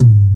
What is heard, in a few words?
deep tom sound g sharp, Loudest frequency: 118Hz